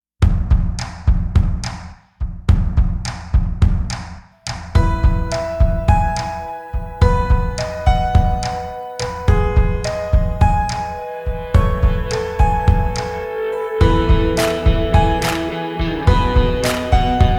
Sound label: No Vocals